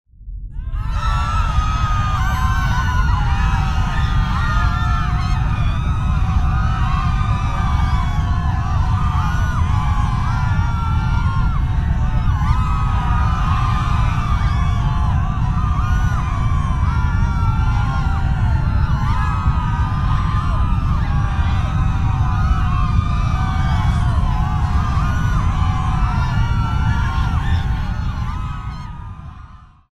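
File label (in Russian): гул с криками людей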